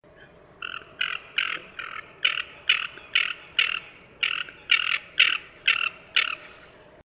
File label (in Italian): Moretta tabaccata Aythya nyroca